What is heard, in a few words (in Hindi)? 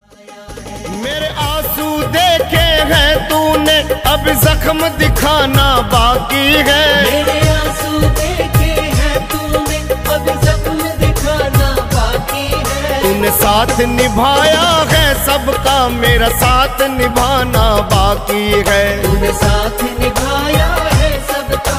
Khatu Shyam Bhajan Ringtone